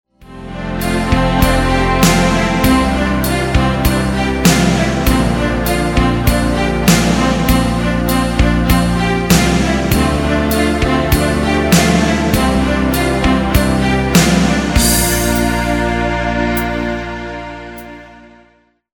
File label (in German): Empty Geigen